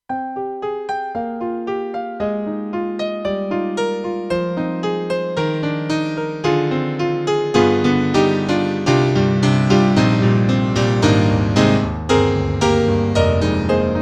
Roland JV-2080 64 voice Piano

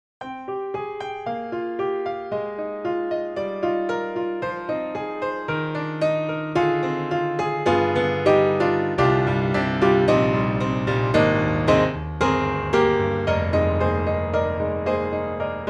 Grand Pianos Studio 7ft Bright Jazz Studio Grand; Synthogy; IvoryII

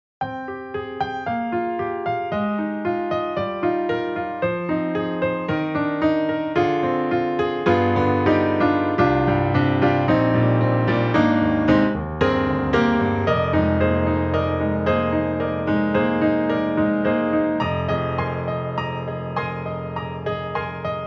Keys Studio Grand Studio Grand; XLN Audio; Addictive Keys

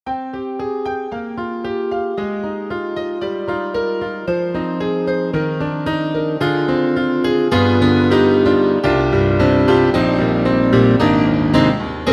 YAMAHA MU2000 + PLG-150PF

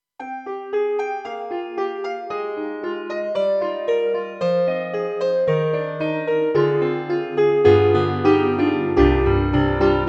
Piano and Subtle One; KORG EXB-PCM08; TRITON-Rack

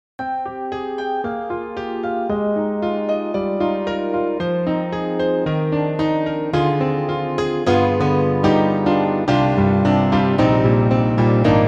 Roland Juno-Gi AOR Piano

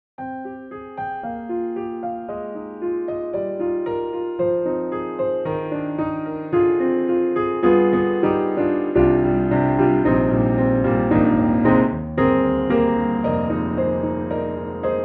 Grand Pianos German D Soft and Delicate; Synthogy; IvoryII

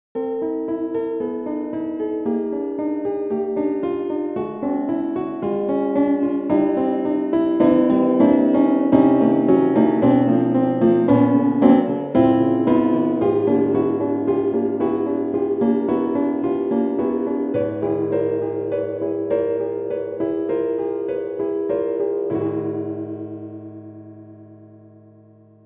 Concert Grand; Native Instruments; Berlin Concert Grand; Nausia

